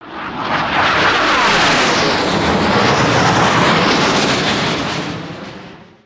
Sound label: over head jets layer, ambience; avant gardens